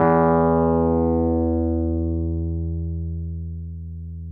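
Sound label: Wurlitzer electric piano; Sonic Reality - Vintage Timetraveler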